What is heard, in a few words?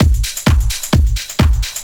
dr t 130 trance 2; DRUMLOOPS; LOOP-TRANCE1; Techno Trance; Ueberschall; Techno Trance Essentials